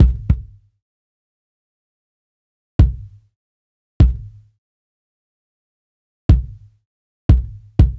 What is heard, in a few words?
Unison Jazz 120bpm Kick